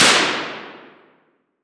Weapon Gun Rifle M1 Carbine Single Shot Interior Shooting Range; AdobeCC